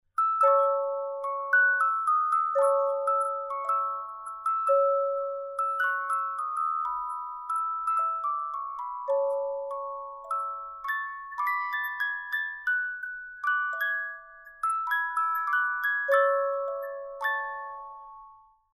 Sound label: Tavern In The Town Pop Tune, musicbox